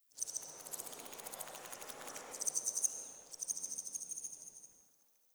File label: Tree Distant Critters Scared; Ambience; Warframe; Duviri